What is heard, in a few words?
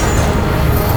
propulsion spin, weapons; deluge